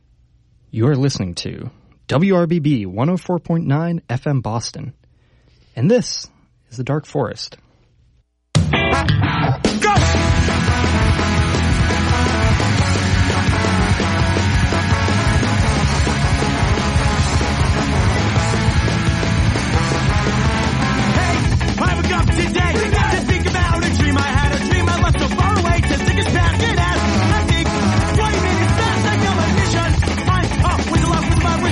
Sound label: ska punk